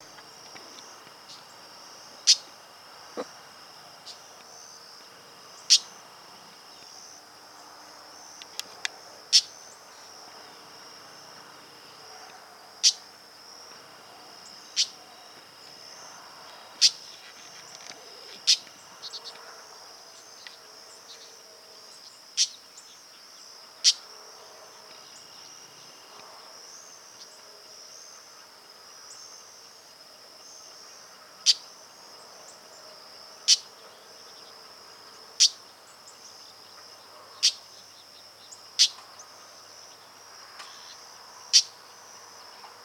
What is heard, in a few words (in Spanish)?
calandria real voz; aves